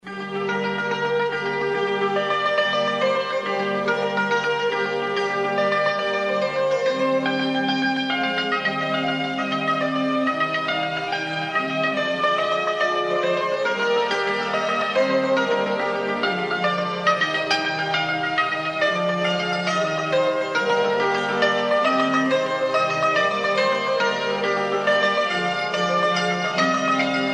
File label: Zang Ringtone